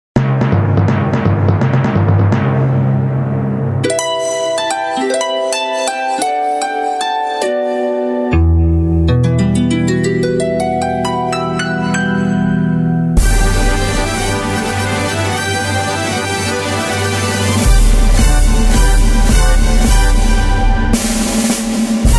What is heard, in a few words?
Symphonic Orchestration; by FILMSCORE